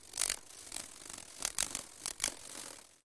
kolibri

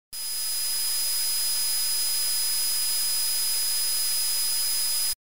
zvon s shumom v ushah

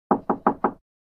stuk v dver

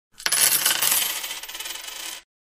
zach den monety

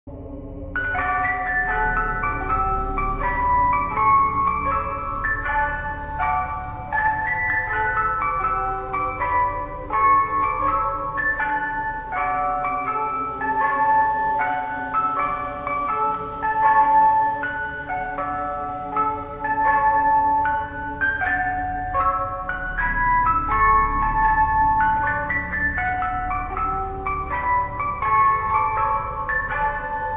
f morojenchika, zvuki furgona morozhenshika

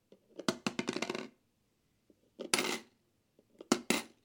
moneta v pustuiu kopilku

